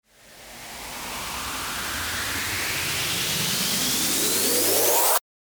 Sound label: RISER